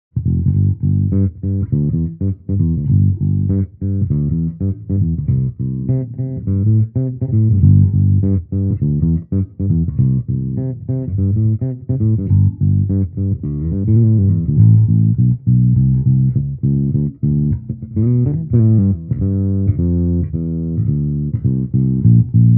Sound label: Crush 100 Intro Ibanez